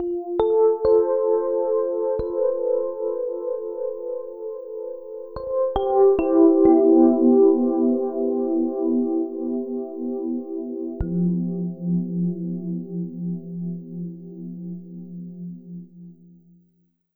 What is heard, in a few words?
FM Keys; Sonic Foundry (Sony Creative Software); Slow Silhouette